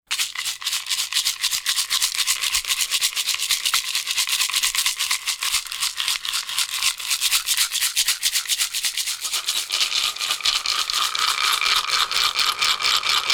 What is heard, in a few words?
Rattle Sequence; Sacral Chakra